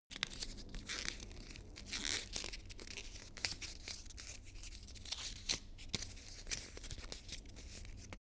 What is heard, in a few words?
paper rustle and rip